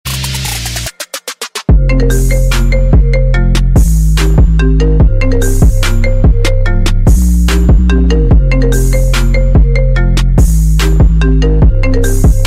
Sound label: iPhone 13 Pro Max; Blaze